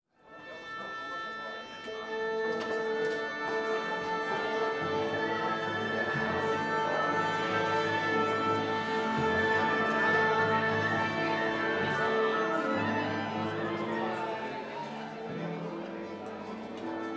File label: Orchestra Tuning Warming Up